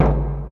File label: bong; sgi